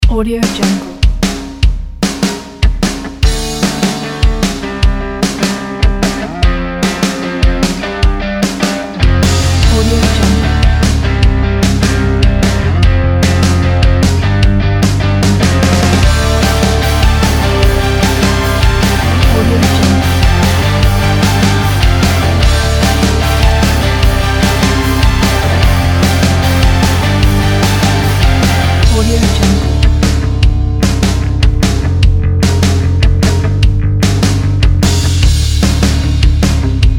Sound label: upbeat rock